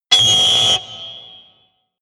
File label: distortion